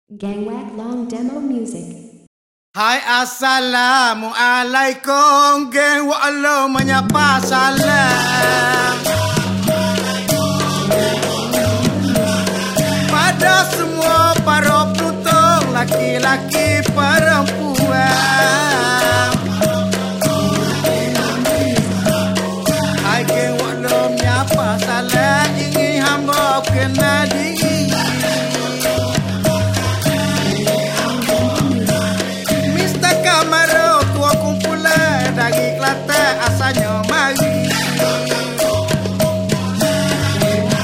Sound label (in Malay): Ngaruk Slow (Karut Perlahan Dalam Dikir Barat)